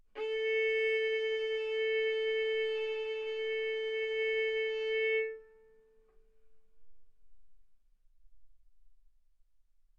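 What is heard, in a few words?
Violin arco mf A string A4 B4 stereo; sul A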